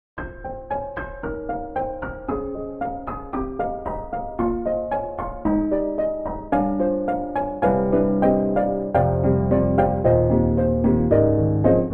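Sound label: Broken Piano; DarkChocolate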